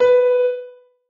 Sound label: noteblock b 2; mesecons; nalc server